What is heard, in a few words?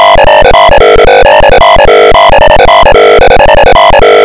tune; skooldaze